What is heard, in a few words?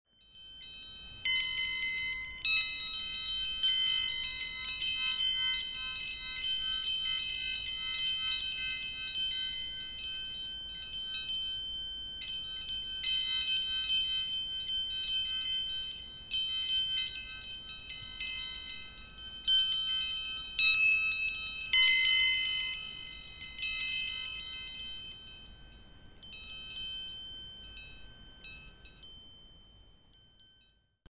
doorbell; doorbell widder